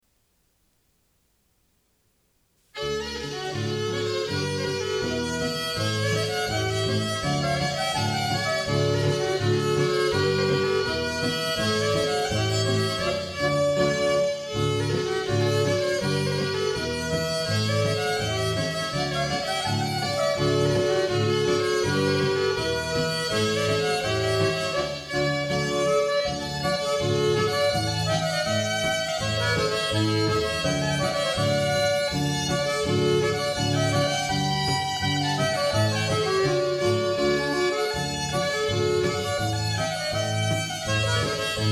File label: Polka